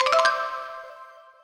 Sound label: Finish SFX; Snow Boarder; Unity